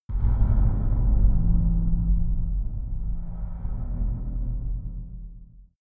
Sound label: far dark metal rumble, hits impacts